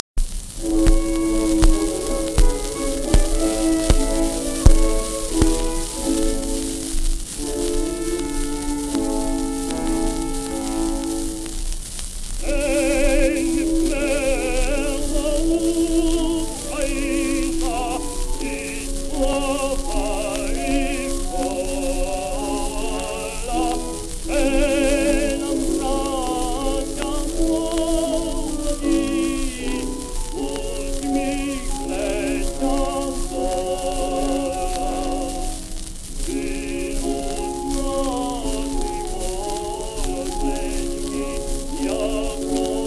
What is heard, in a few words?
ukrainian national anthem